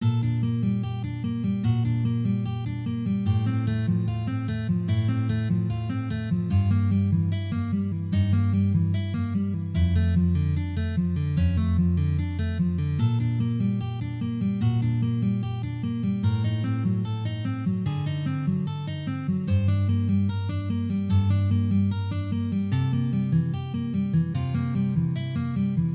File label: For guitar in DADGAD; legend zelda ocarina time